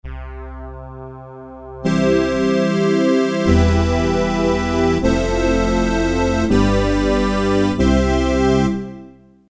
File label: Pressure Pad, Roland PN-D50-01